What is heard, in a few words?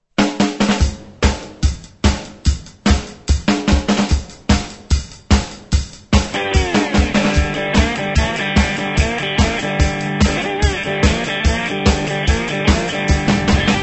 Zydeco